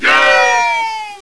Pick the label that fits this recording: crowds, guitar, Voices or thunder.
crowds